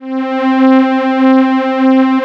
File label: Atmo Pads; Best Service; XX Large